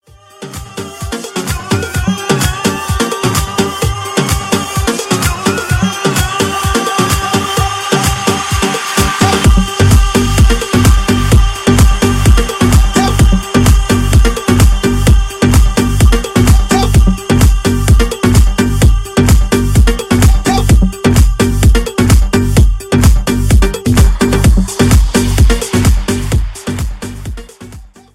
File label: Remix Tone